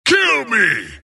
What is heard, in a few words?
death long; dota; skeleton king